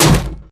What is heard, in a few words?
bullet heavyballistic titan; Titanfall 2